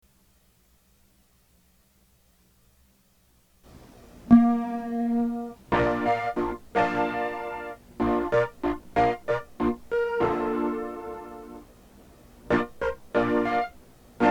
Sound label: chorus